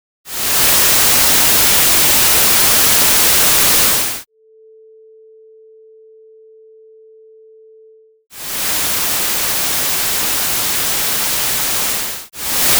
Binaural Sines Plus Noise

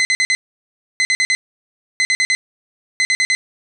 alarm, synthesis

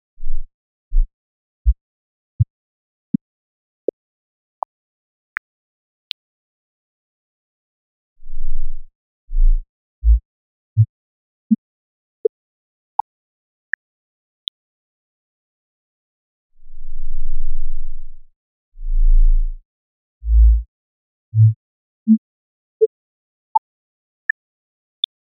Multi-Period Tone Bursts

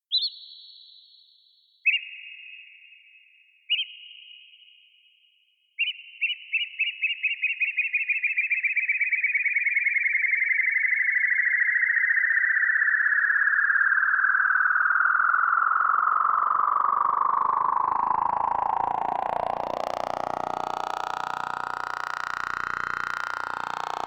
flock

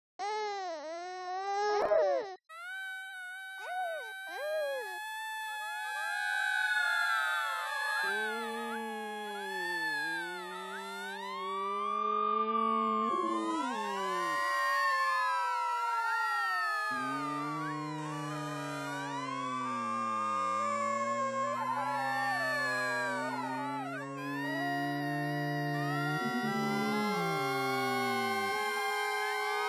shorter chorus, synthesis

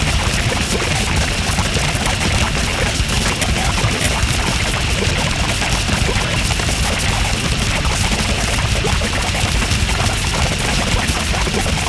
metal recompose

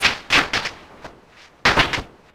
door LPC, synth

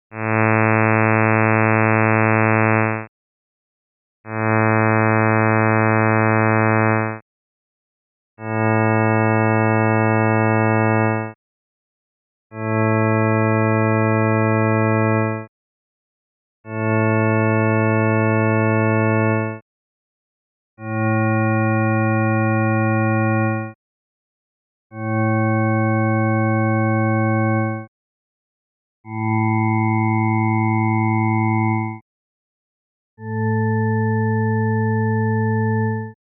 Holy Tones

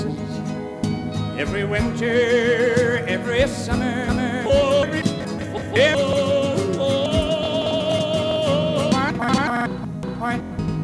country recompose

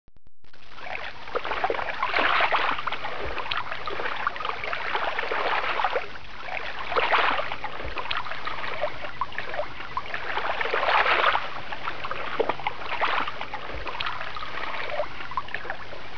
shore, synthesis

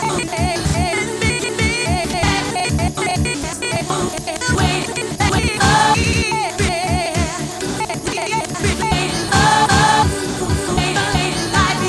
disco recompose

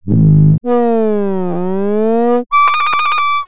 blasto

